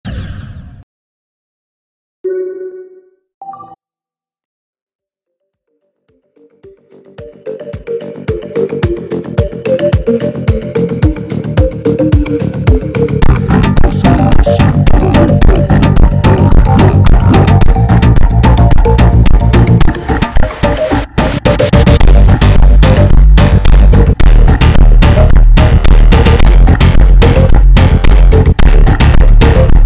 Funk slowed

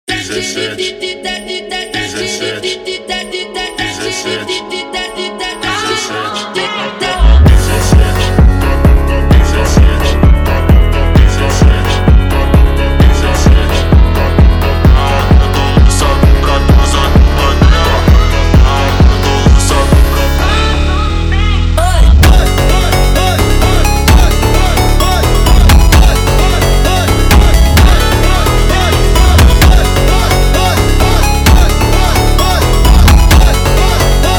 Funk

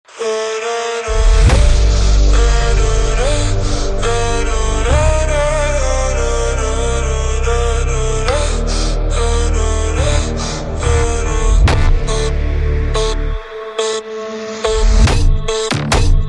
Montagem ultra Slowed

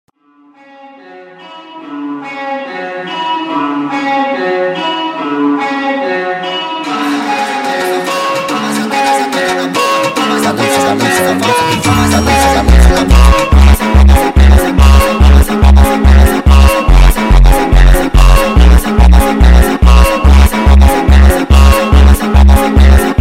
Funk Sped Up

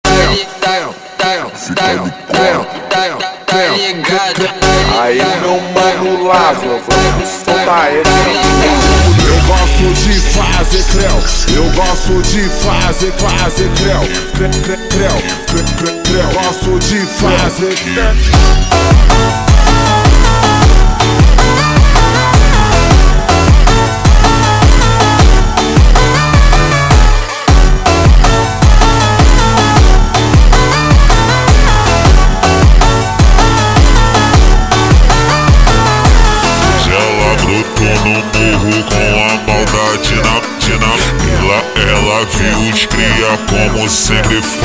Funk super Slowed

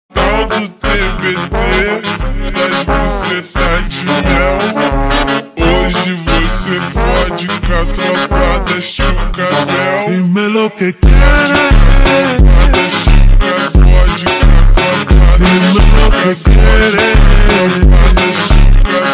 Phonk

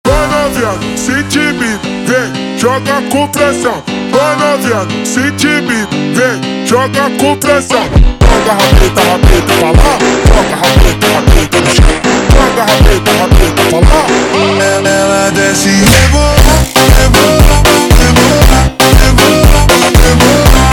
Montagem slowed

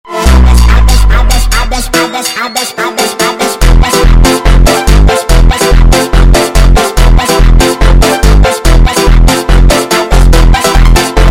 Funk sped Up

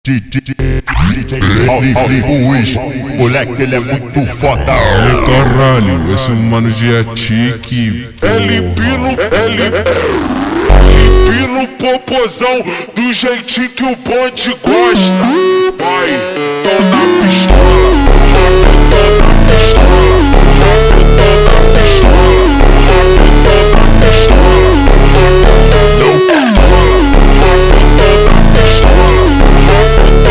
Montagem slowed

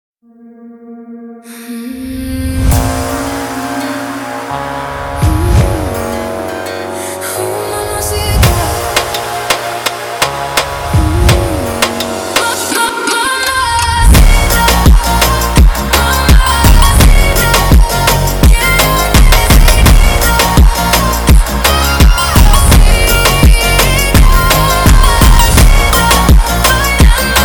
Funk

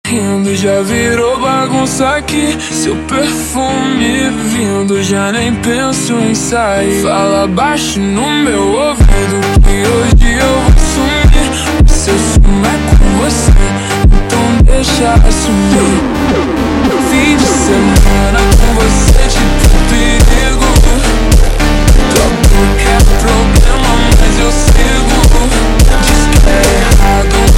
Montagem super Slowed

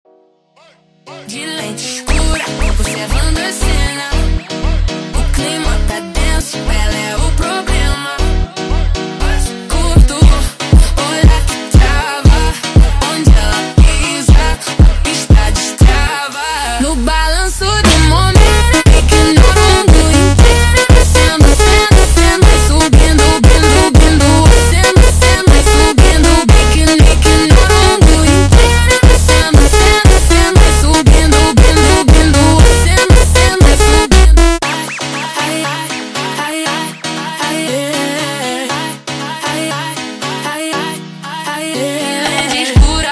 Montagem super Slowed

